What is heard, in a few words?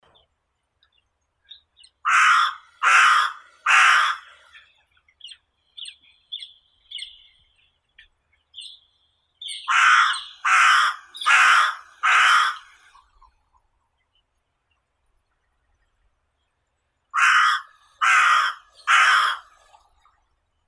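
hooded crow, Corvus cornix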